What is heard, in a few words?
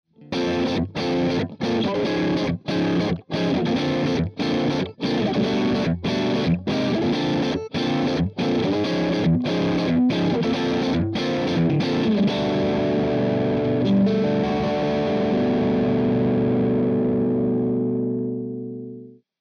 Complex Chords Torpedo Orange 2x12